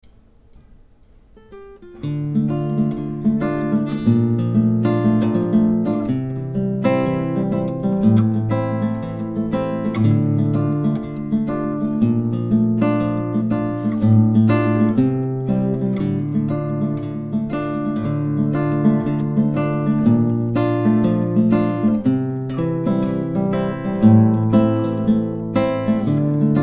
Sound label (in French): accomp